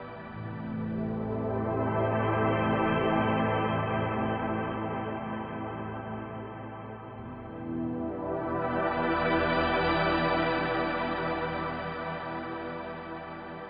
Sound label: techno pad 140; Techno2; Keys